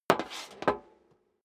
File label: step high heels metal rattle scuff; schritte